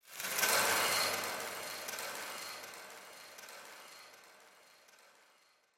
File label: clicking echoes, ambient creatures; Half Life Alyx